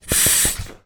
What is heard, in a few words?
lilo rubber ring blow into inflate single; zapsplat